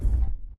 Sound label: footstep jog; Titanfall 2